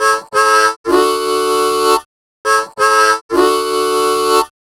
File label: Harm 098bpm A, Harmonica